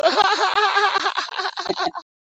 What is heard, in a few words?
haha